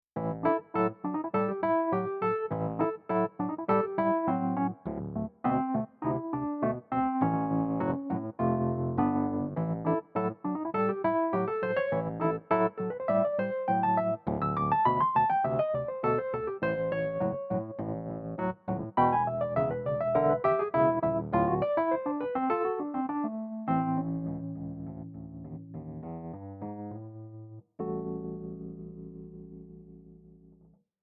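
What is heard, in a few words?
reed electric piano; RD-800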